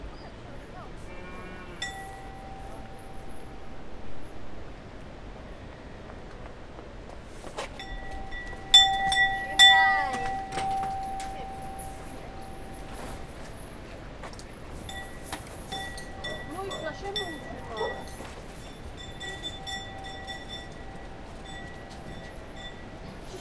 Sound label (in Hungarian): szarvasmarha kolomp